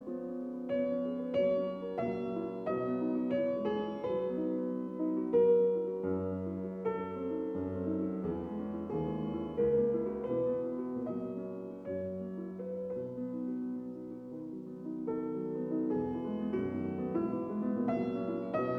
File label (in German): Lied ohne worte; Mendelssohn; op 19